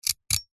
household comb metal teeth scrape hard single with sharp metal; zapsplat